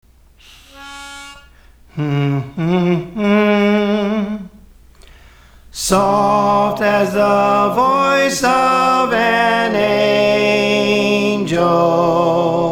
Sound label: Soprano; Praise For The Lord Training